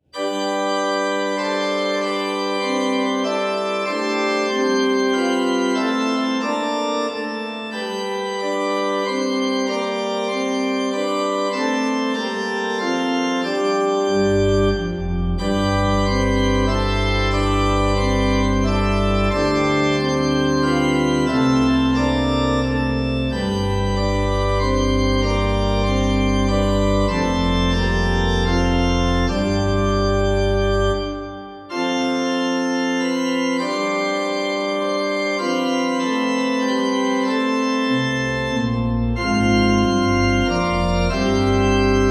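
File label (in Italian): corali, organist; 4) Jesu bleibet meine Freude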